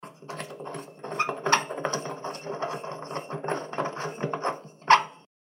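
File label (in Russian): Лампочку вкручивают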